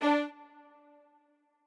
strings

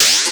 vox

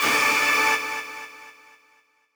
stab

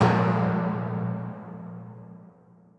timpani

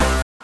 synth

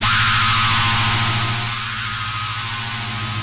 bass

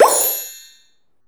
RESULT GAUGE TWINKLE; maimai

